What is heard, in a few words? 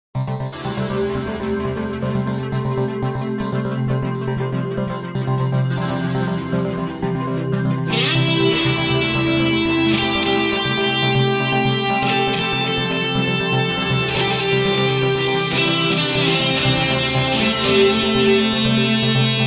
guitar rig test